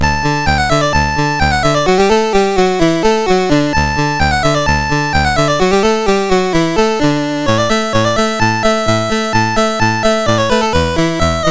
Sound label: Musette, MOS6581R4